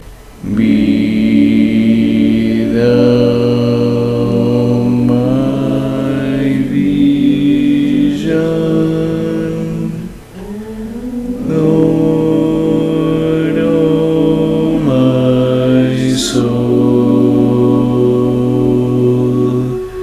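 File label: baritone, barbershop tags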